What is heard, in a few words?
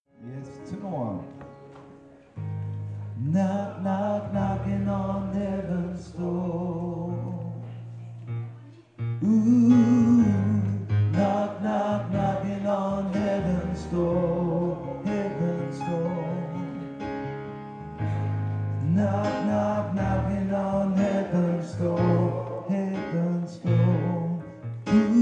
tenor